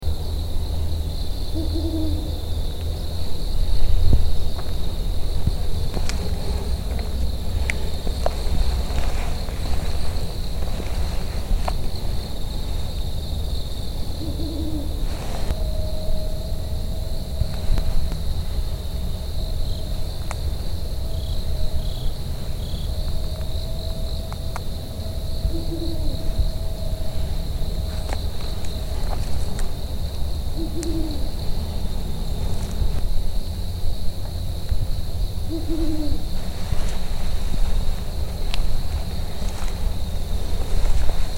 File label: brown wood owl strix leptogrammica